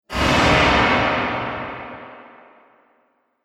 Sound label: Dramatic Movie Drop; Sci Fi Sound Effects